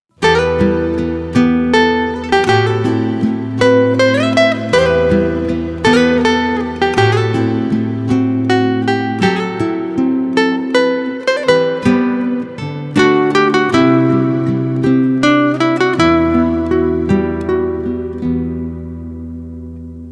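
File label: Bikalam With Guitar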